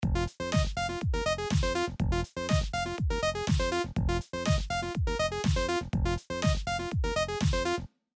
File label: quantize